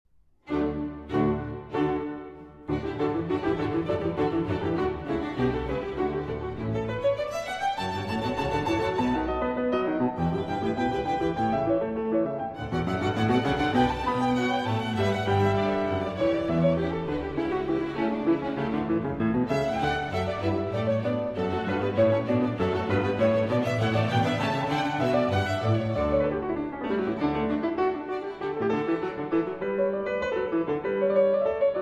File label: Keyboard Concerto in D Major, Allegro; BWV 1054 I.